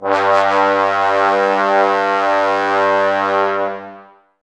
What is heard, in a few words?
Brass, Woodwind Brass Ensemble; Miroslav Vitous